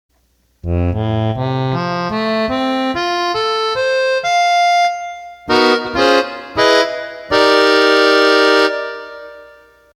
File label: Accordion Jazz